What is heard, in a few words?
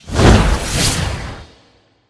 Wing; Karen; Gamigo